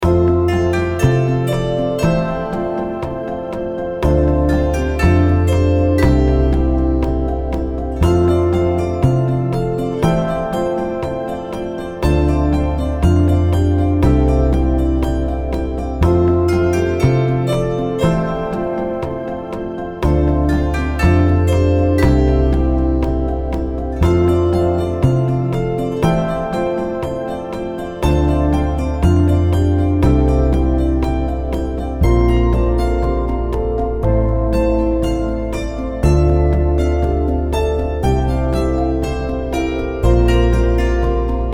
Relaxing Dojo

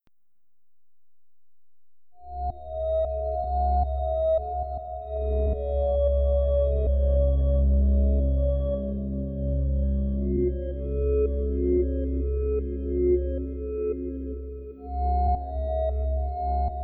vinyl studies

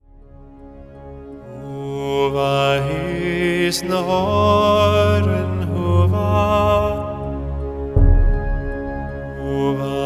gaelic

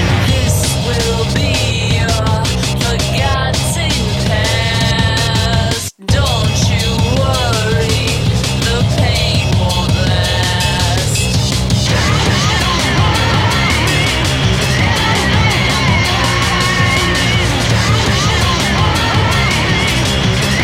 Rock a Bye

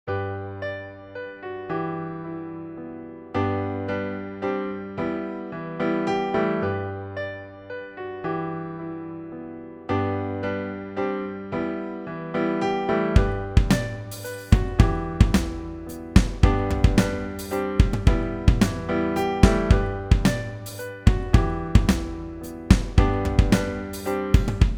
Piano roll

